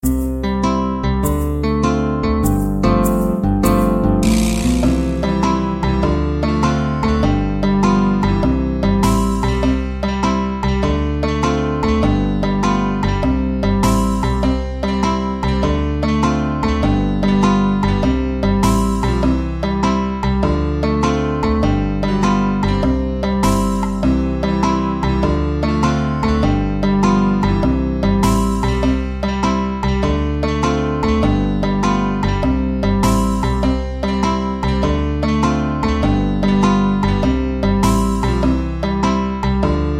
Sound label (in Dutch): instrumentaal